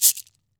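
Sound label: cabasa, GM percussion kit; AKAI S-Series CD-ROM Sound Library VOL-5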